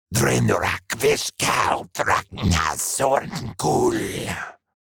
Demon beast imp monster spell casting

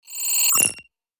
Rocket launcher target acquisition beeps; artillery; gfxsounds

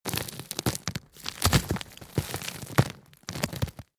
Dirt and debris fall small

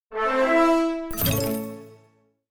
Mobile game epic reward

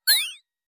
Cartoon button

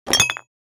Rattle of glass bottles in trash can; household

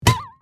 Cartoon body punch smack

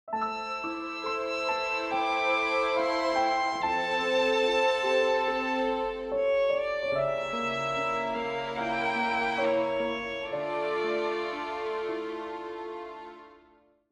Game over you lose sad dramatic

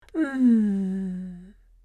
Old lady pleasure moan

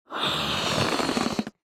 Blowing up a rubber balloon from mouth

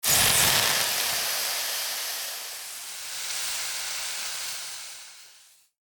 Omelette hissing pan cooking; household

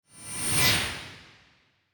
Smooth high frequency whoosh; transition

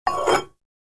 Taking off a plate of a barbell 10 pound weight

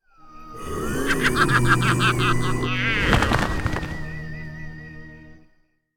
Halloween spooky whoosh transition

